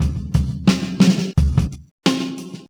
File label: Drum loops, Boombap